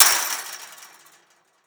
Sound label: wall fence; Golden Crayon Sounds